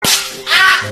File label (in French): fouet cri